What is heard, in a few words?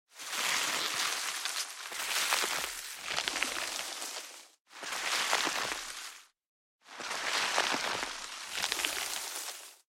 crunch